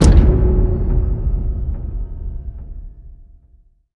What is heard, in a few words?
Close